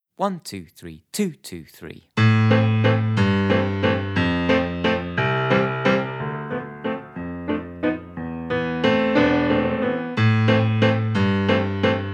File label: Childrens Piece Playalong